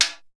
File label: Perc; ZERO G - Datafile DISC 1